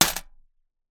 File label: Coin Drop in Tin Coins